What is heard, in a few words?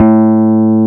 PURE45, PUNCHREEDS